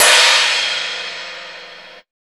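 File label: cymbal, Big Beat Drumloops, electro 125; USB Soundscan vol.25; AKAI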